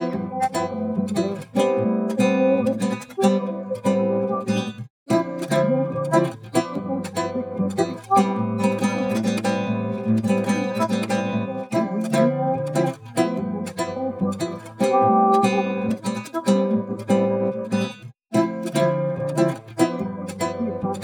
guitar; SiSEC11

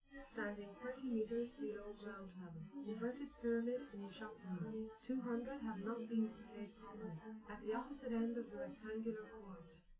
female live rec 1m sim; SASSEC07

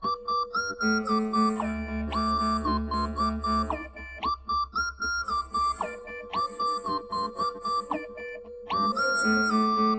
nodrums; SiSEC10; underdetermined

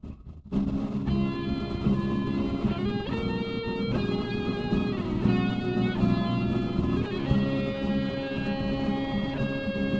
no drums inst src; SiSEC08; SiSEC underdetermined

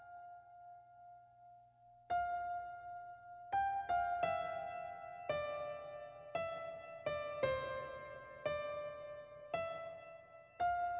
nodrums live rec 250ms

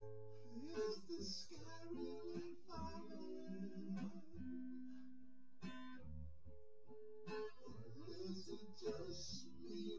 no drums synth conv 250ms 1m; SiSEC08; SiSEC underdetermined

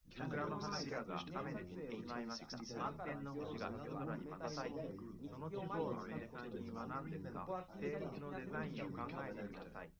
male live rec 5cm sim; SASSEC07

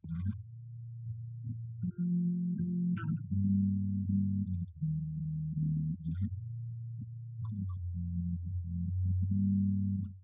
nodrums; SiSEC10; underdetermined

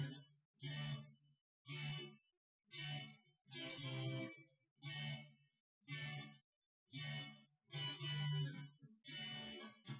wdrums synthconv 250ms 5cm

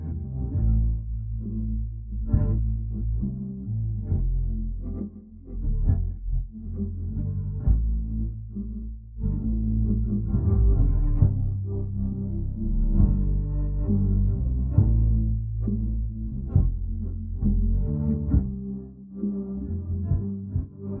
bass; SiSEC11

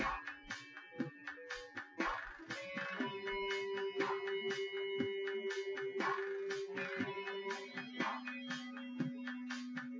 wdrums synthconv 250ms 5cm; SiSEC08; SiSEC underdetermined

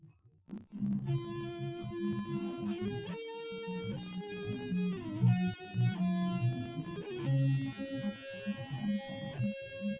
nodrums inst sim; SASSEC07

